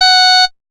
Galactica, F#4, Synths Multisamples; Ableton Live 12 Lite; Core Library

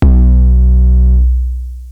Kick; Ableton Live 12 Lite Core Library

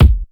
Kick Thud Mix

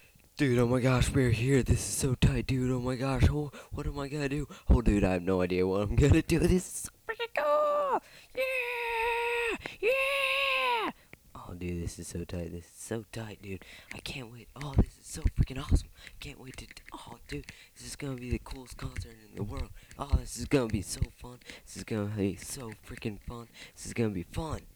crowd

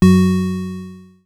Pulse Bass - F#4, Synths; Multisamples; Ableton Live 12 Lite; Core Library

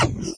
grab gun, paintgun; There; ThereClient

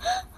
Vocal Inhale; Ableton Live 12 Lite; Core Library

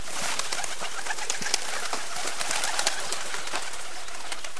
bird flight; To the Moon

